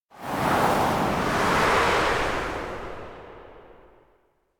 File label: elec hurricane vanish; anemoi